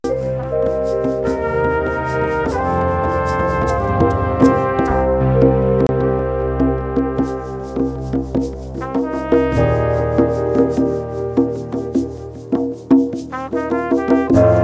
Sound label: nude loop; Nude Loop #1; Nude Loops